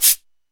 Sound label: cabasa; Drums; Ableton Foundation FreePacks